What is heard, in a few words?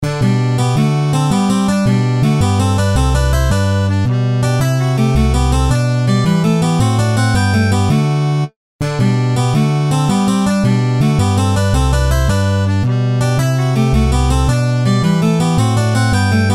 Handel Water Music Gigue